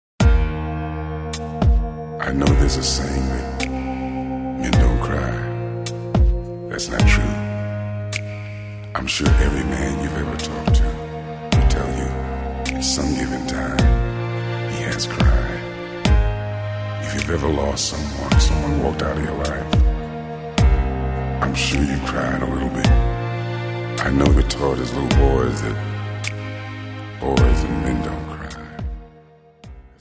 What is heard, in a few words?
men cry too